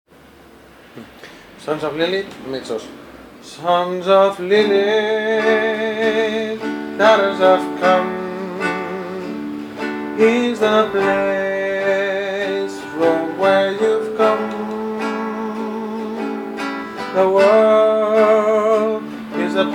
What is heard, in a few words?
mezzo